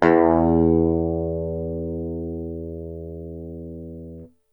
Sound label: low E recorded